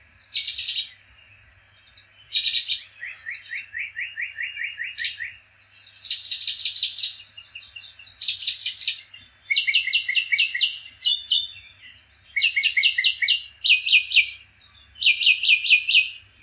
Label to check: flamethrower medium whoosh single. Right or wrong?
Wrong